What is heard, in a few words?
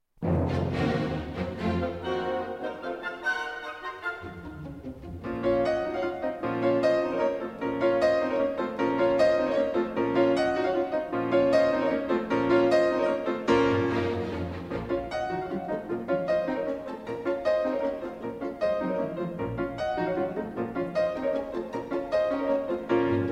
Piano Concerto in B-Flat Minor; No. 1; Op. 23, TH 55; Tchaikovsky